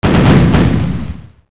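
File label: gun cannons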